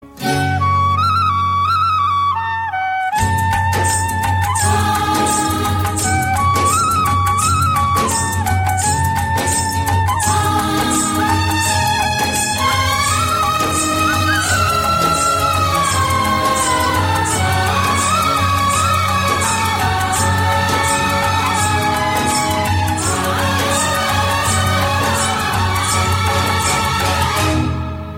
indian old song

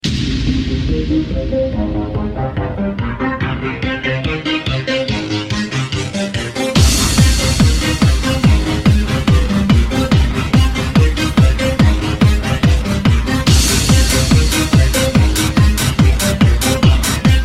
techno ringtone

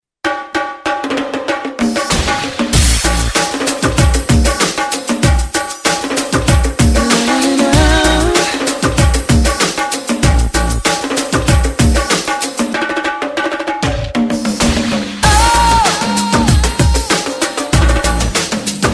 drums

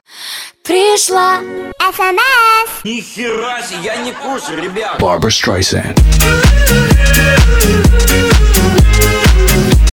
prishla sms mix

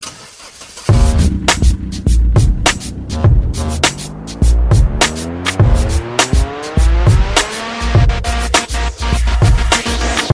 car mix

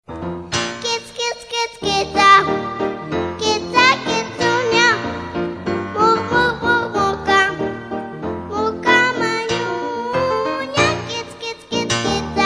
baby singing

